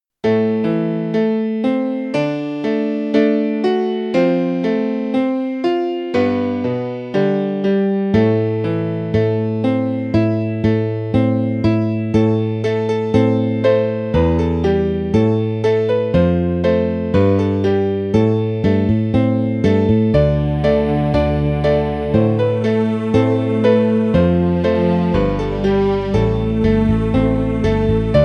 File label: intro and strings; arranged by lascowan 2015; Official full band arrangement